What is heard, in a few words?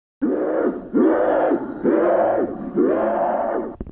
Zombies